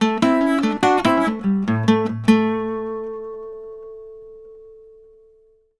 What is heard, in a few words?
Blues Guitar Samples, licks; ILIO; Fretworks